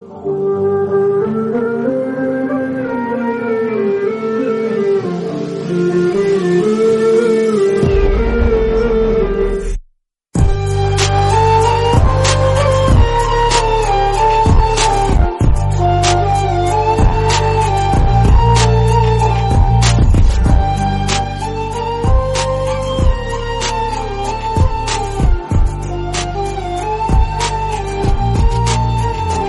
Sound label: sad flute cover bgm